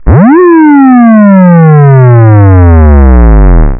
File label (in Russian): sirena na cd4046